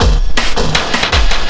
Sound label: LOOP L, Drum & Bass 160 BPM